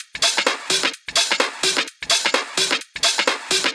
Minimal Loops

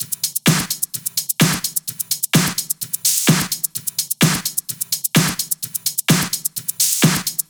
128BPM Kit; Vengeance Samples; VFH1 128BPM Big Tee Kit

